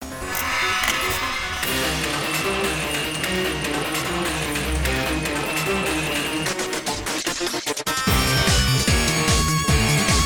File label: battle boss; flare; pokerogue